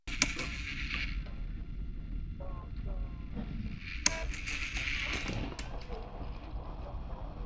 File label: Hospital Iv Machine